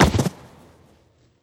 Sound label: Wall Hit Layer